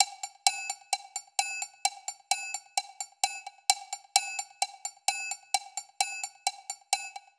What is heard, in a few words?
Timba C; Latin (Латино)